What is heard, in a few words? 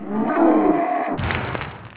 CRAWLER BUNCH